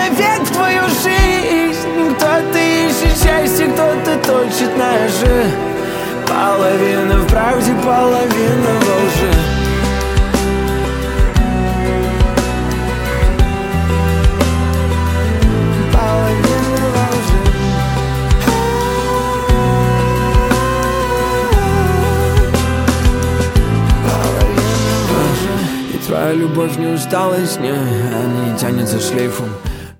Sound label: acoustic version